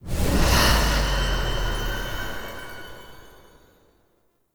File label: Menu Open; UI; Warframe; Tau Mission Menu